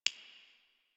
Messenger Hover; Ambience; Warframe; Nineteen Ninety Nine

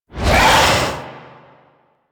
Symbiotic Link Cast; Warframe; Warframes

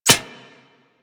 Arrow Impact Metal; Warframe; Weapons

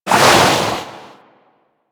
Shark Tail Move Fast; Warframe; Grineer Ocean Shark

